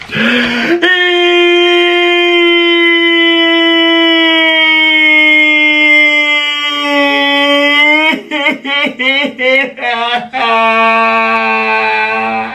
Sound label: crying; nikocado avocado